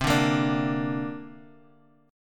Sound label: C 7th Suspended 2nd, C7sus2 chord; strummed; 20,17,20,19